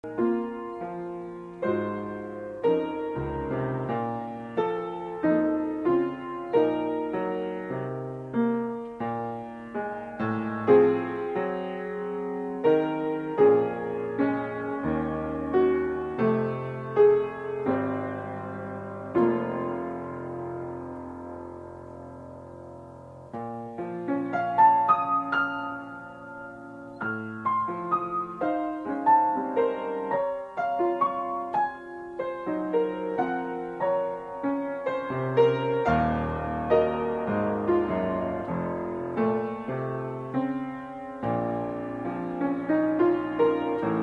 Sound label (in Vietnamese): nhac khong loi viet nam; VOV3